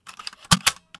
floppy

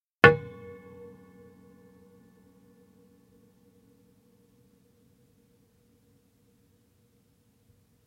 TV echo

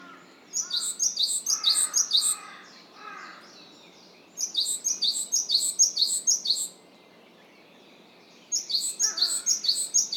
Great Tit song

